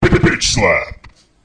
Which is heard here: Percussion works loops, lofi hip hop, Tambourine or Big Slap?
Big Slap